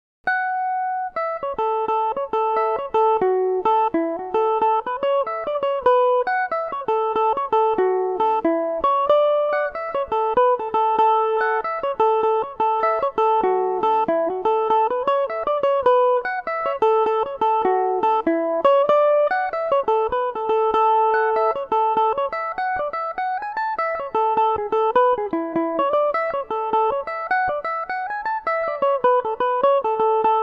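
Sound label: A major revelation, Irish mandolin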